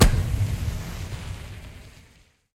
Flare Ignite; Tools

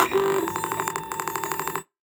DEFENCE BOT idle; Defensebot

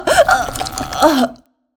choke Female, HUMAN

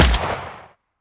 assault Rifle; Security; WEAPONS

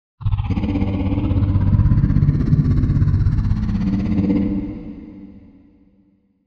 ENDWORM idle; Barotrauma; Legacyendworm

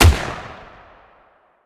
hmg Shot; Security; WEAPON

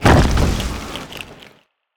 attack; Barotrauma; Latcher

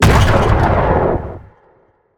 laser Gun Shot; Weapons

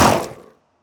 SWARM ENEMY death; Swarmfeeder

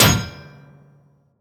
Metal Impact Heavy

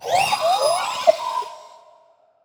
BABY MOLOCH idle

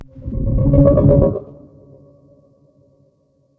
MOLOCH attack

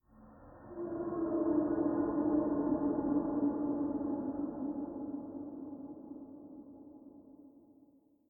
Distant Pod, Ambient

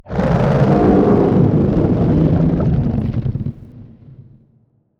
ENDWORM idle

